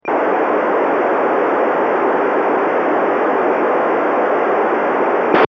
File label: D-STAR